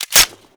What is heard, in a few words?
colt 1911 reload chamber, foley